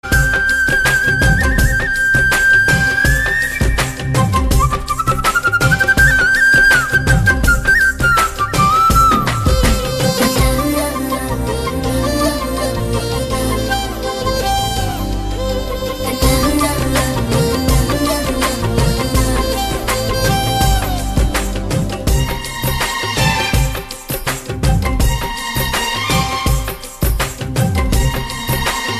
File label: run music